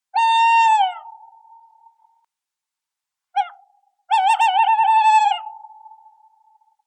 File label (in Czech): puštík obecný samec; ukázka; jak kdy kde slyset pustika